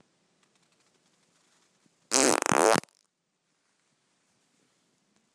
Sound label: i farted again